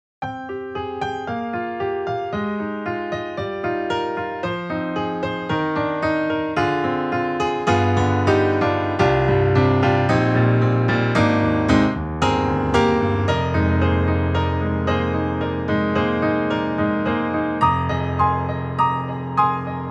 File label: Studio Grand, Intense Keys; XLN Audio; Addictive Keys